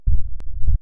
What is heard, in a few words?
Fast heartbeat